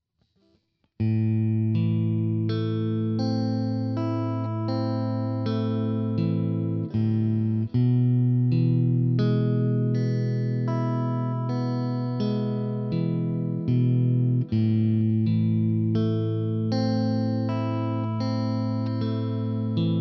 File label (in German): Zupfmuster, gitarre